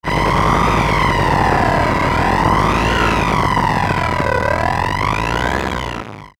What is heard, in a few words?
Transmission Distortion Signal Ascend Descend; Communication; Blastwave